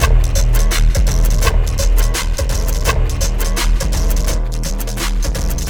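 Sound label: flatline 84 bpm; Rarefaction; Poke in The Ear With A Sharp Stick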